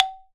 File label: Tuned Percussion; Polyend; Simulacrum Sounds; 1 Shots; Acoustic and Electric